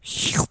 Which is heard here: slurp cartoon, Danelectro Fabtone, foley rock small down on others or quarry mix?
slurp cartoon